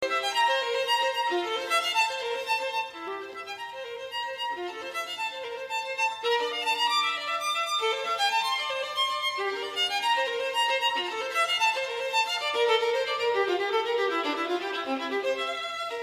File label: violin